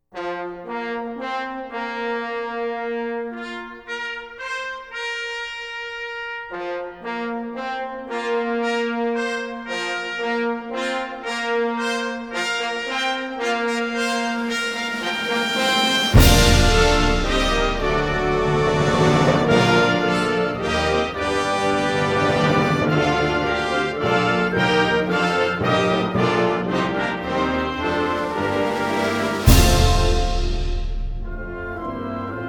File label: Flourish for Wind Band; The Johnson State College Concert Band